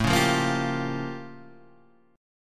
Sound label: A Augmented 7th Flat 9th A; strummed; 1,3,sharp5,b7,b9; JGuitar